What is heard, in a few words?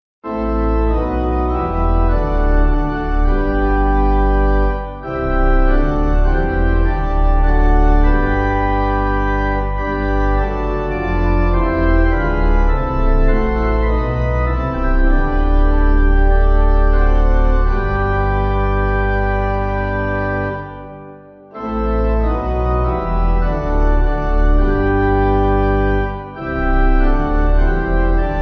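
Pipe Organ, Music Backing Files for Churches; Eccles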